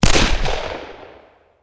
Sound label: medium Win; netent